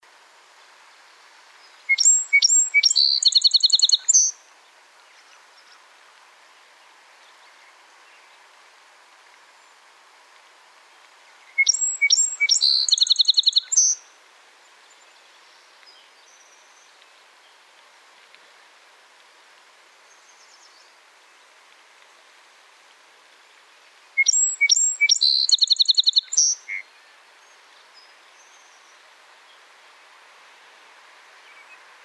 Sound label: Song Sparrow - Melospiza melodia, SONG